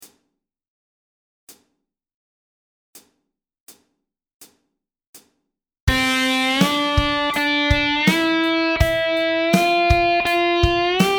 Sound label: bottle, guitar